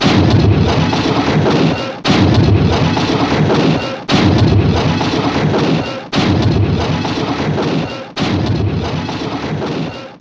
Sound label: Car Crash